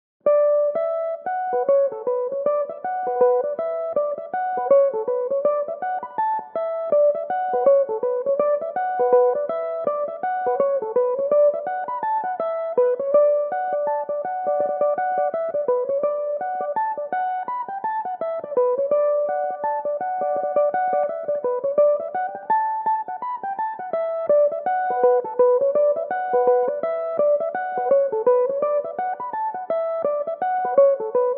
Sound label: reel E dorian, Irish mandolin; Pick Your Partner; Belmuse EM-190 electric mandolin